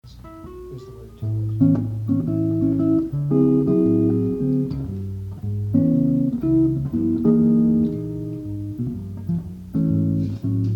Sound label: Chord Melody; Nick Stasinos